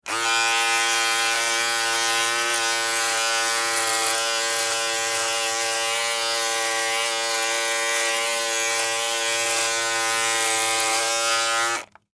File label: Household Electric Razor On Run Off Shave Face Beard; Cleaning